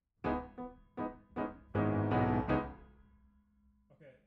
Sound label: Piano B